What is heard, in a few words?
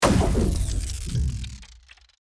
mecha sound effects designed mecha impact; vfx post production